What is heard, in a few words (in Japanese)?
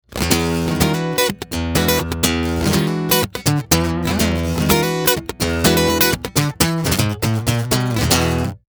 エレメントカッティング